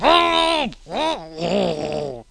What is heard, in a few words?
die